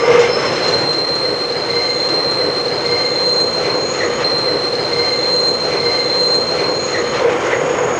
TRAIN; trasporti; treni

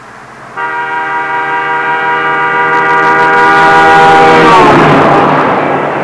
car pass; trasporti; auto

